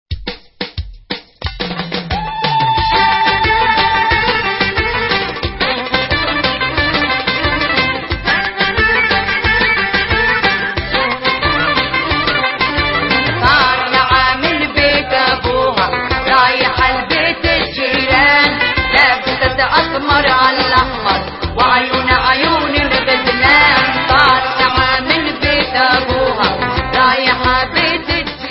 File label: Vocal